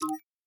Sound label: Ui Button general